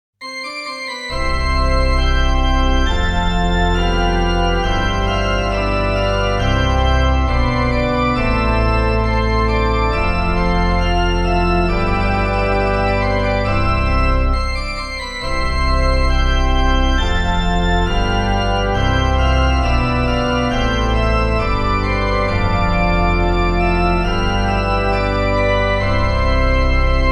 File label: Church; Climax Landers